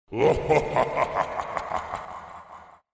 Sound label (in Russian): rastvoriauchiysa smeh